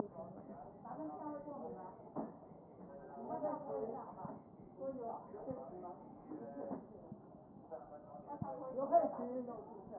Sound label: chest